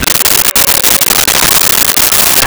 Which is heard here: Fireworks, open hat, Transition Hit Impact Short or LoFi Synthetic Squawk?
LoFi Synthetic Squawk